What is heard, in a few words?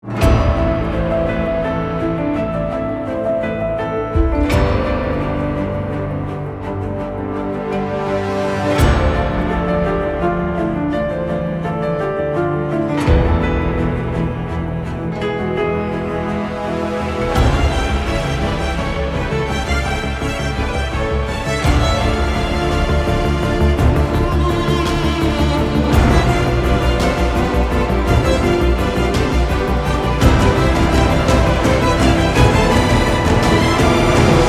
piano